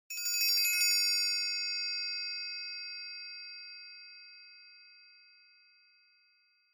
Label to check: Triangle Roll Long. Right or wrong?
Right